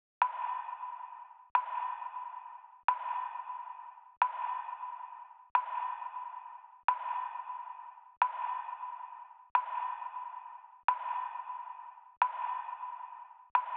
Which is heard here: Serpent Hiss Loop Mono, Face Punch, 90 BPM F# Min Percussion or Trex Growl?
90 BPM F# Min Percussion